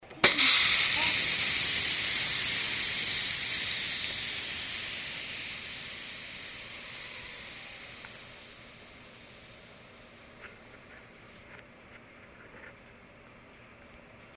Letting Off Steam

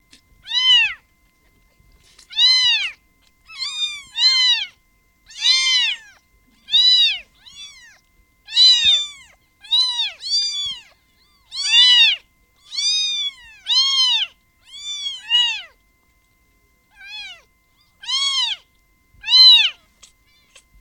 Kittens

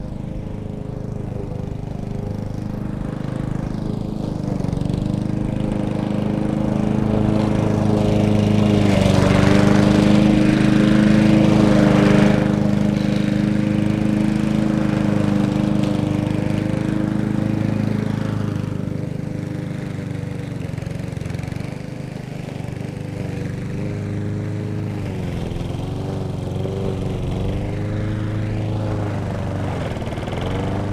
Mowing Weeding

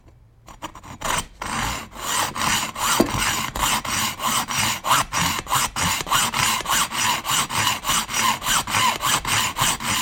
Hacksaw

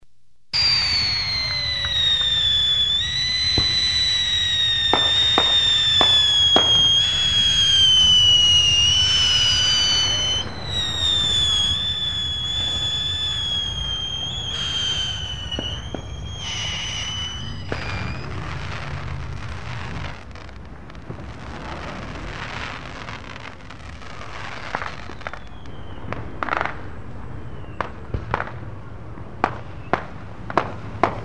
Fireworks Mix

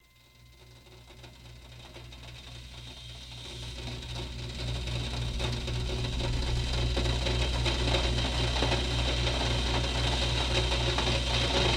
Teapot

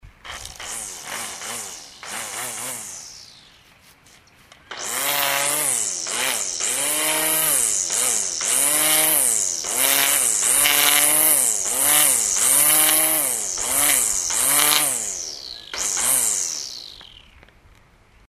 Weed Eater

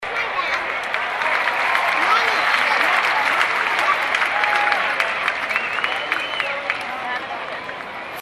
Light Applause

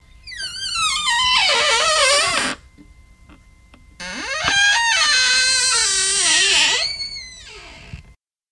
Creaky Door

